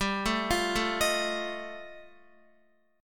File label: G 7th Suspended 2nd Sharp 5th G, Guitar; x,10,9,10,9,11; down; 4F,4A,5C,5G,5C,6E